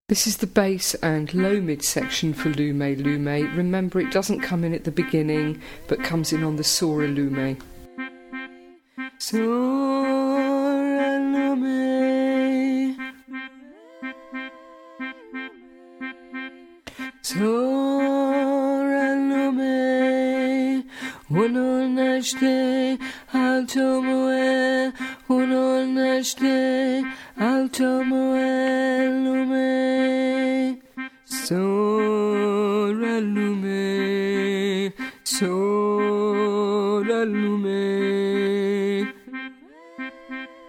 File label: low mid and bass; Lime Lime